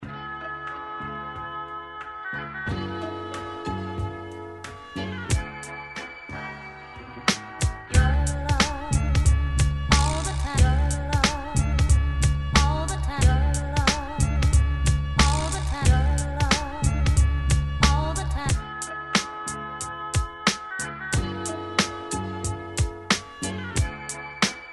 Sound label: Beats